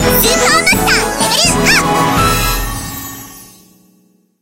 level up

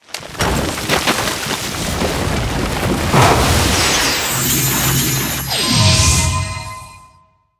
gacha start

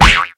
hit

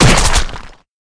hit

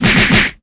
hit body punch

